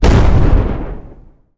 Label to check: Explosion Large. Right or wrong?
Right